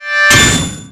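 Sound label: Battle Skill; Etrian Odyssey III HD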